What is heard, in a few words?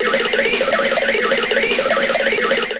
spin; DR BRAIN; BUGS